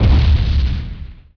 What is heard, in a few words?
boom; BESTSHOT; noisybit